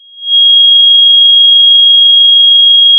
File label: Sound of a tea kettle whistling